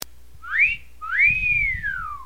efeitos sonoros fiu fiu

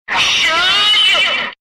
megafone

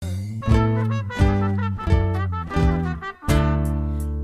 trompete zoado

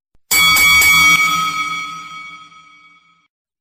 som sinal de briga luta de boxe sino ufc

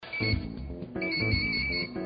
urna eletronica

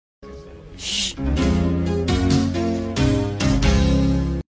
musica relaxante; gracie films

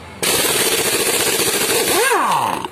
parafusadeira pneumática

